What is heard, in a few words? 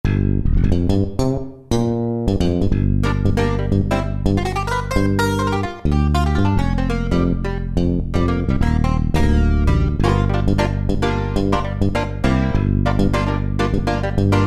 Funky Cutting; Roland PN-D50-04